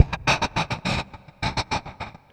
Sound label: Korg, FX